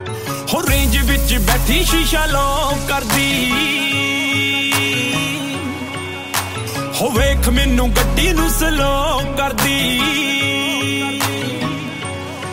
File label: Punjabi Ringtone